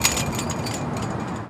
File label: Chainlink Fence Perc, percussion; Lindale; HOUSTON